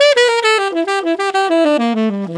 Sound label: Tenor in E min, Tenor Sax Loops; Sonic Foundry (Sony Creative Software); Jazz Solos and Sections